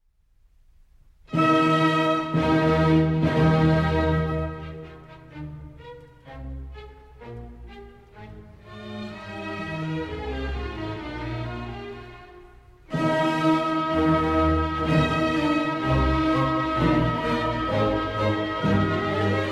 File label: Trumpet Concerto in E Major, E-Flat Major Version, Allegro; Hummel; WoO 1, S. 49; I. Allegro con spirito